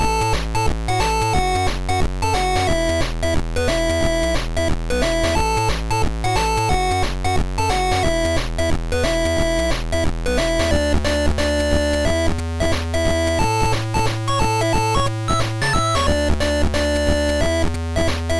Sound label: Intro Song; Toy Story 2 GBC